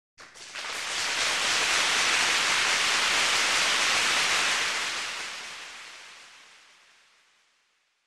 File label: aplause loud